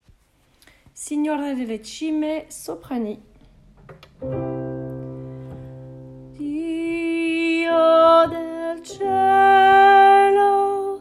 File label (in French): soprani; pupitre soprani